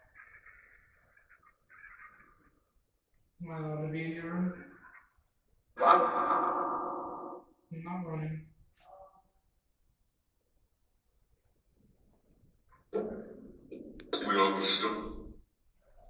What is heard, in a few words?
Phasma Box; Barbershop Corner